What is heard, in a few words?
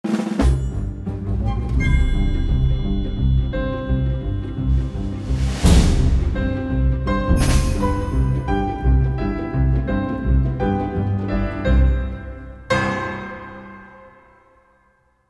Bouncy Little Bumper